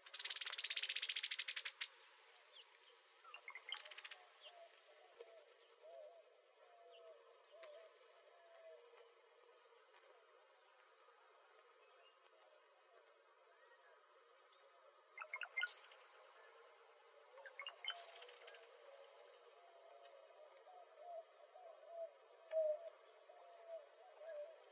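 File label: Brown-headed Cowbird, Icterids; BHCO; deviche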